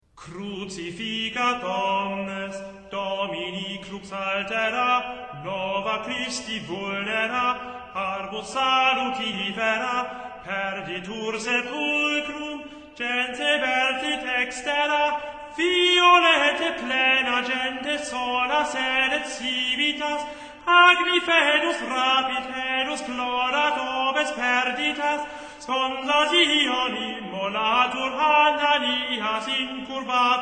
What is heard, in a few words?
organum; Crucifigat omnes